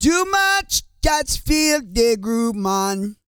REGGAE, Vocal Planet World Voices

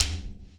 RIT STICK, Ethno Techno RITUAL; ILIO - Ethno Techno

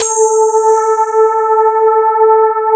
CMI PIANO; East West; Twisted Textures-BT

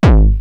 Roland TR-909 BD; BD Selection

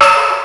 Ambi Jar, Fairlight CMI III

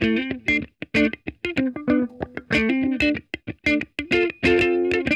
ROLLER, Funk Guitar; Zero G - Funk Guitar

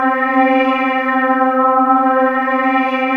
ring mod; Zero-G Ambient 1

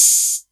808 Hat O; Drums

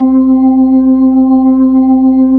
B-3 Jazz C5; Keyboards of The 60's and 70's

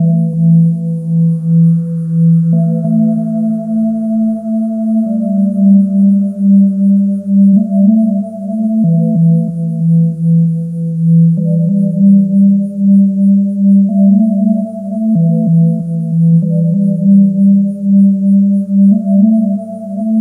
Stone Tones; Sonic Foundry (Sony Creative Software); Loop Noir

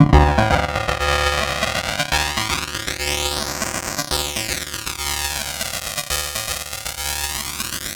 Techno Synth

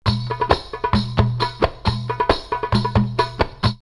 Jeddah 134, drumloops World Ethnic; X-Static Goldmine

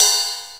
DMX Ride, Oberheim DMX

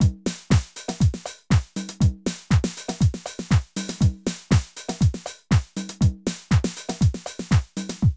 drum loops, House & Garage

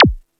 SYNTHBASS; East West - Global Sounds

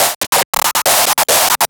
Scratch Fills 140BPM

